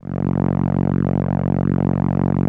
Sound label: SOLINA, String Machines